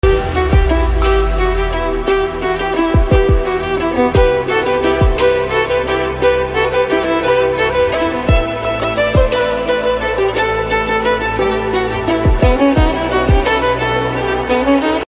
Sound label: violin cover; Carol Of The Bells